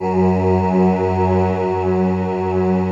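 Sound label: slow orchestra pad, Slow Pad 5th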